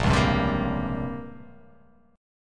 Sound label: A sharp Minor 6th Add 9th A sharp down, chord